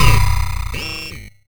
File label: Jump boost; Cruelty Squad